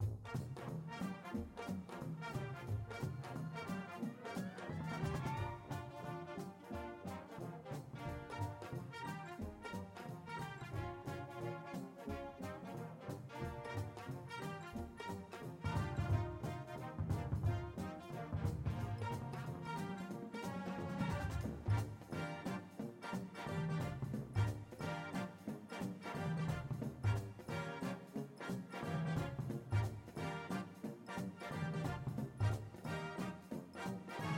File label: bass w music